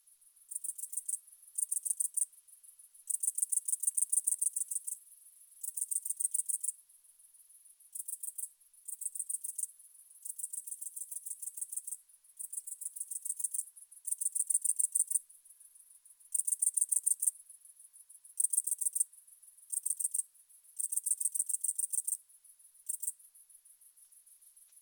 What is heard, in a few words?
Grasshopper